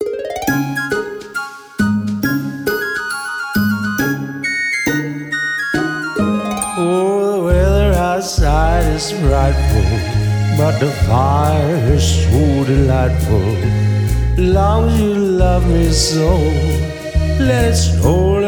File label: Solo Singer, London